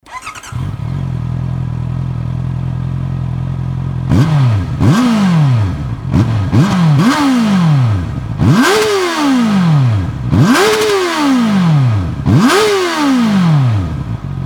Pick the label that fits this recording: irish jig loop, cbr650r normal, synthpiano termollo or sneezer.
cbr650r normal